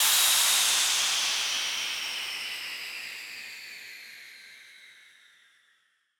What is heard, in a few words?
White Noise Downlifter; Cymatics; FX Essentials